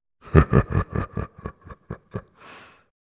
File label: thugs Laugh; Pitch Black - A Detective's Demise